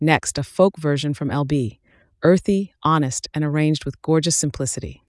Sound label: Folk Version; Indie Q Radio